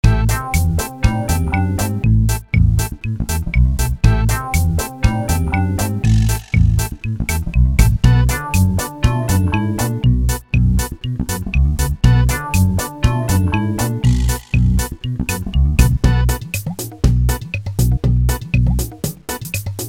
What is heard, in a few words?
Gameloop; Rig a Bridge; Tom & Jerry